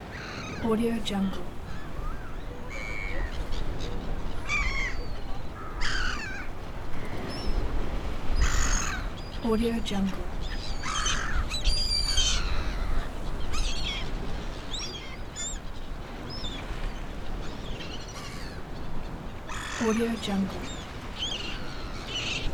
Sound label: seagulls